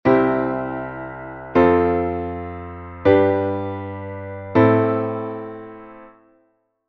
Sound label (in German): Akkordfolge Trugschluss